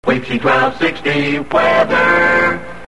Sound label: WEATHER SLOW jingle